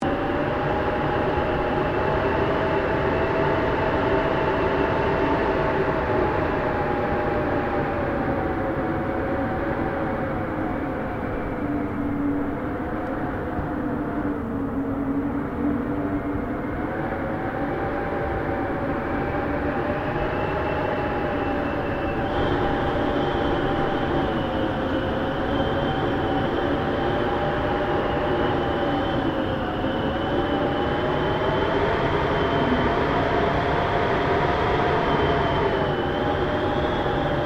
Wind Howling Loop; Elemental weather effects; Wind (Sound Effects:Weather)

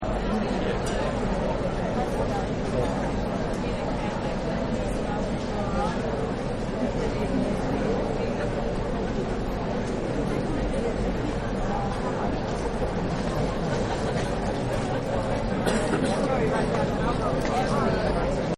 Audience Ambience Loop; Crowds; Backgrounds

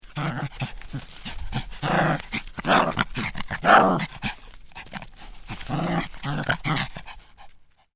Small Dog Growling; Growl, sneer, small dog.; Animal Small Dog Growling 2

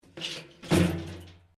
Pipe Tin Move; Material components to create more complex sounds; Materials; Metal

